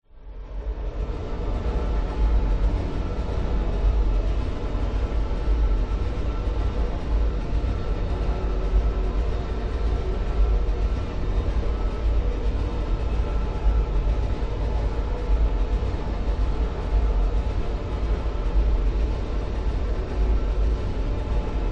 horror drone ambience dark hectic; zapsplat

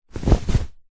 household bedsheet movement single

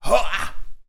Human Evil; little robot sound factory

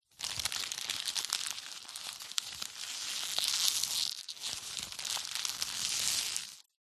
foley paper wrapping handling squeeze

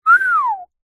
human whistle soft

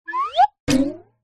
cartoon ascend hit ceiling

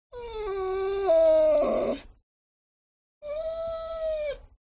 DOG Dogo Argentino Whine Complain; ANIMAL; smartsound

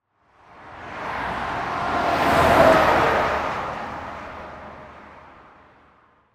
Whoosh Car Pass By

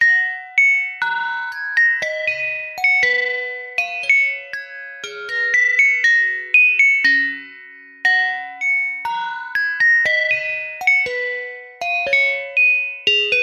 musical ice cream van musical chime; zapsplat

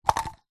household cups plastic drop onto others; zapsplat

